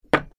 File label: Small wooden blocks set down; wooden sound effects